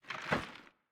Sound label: old Line Falls; cashomatic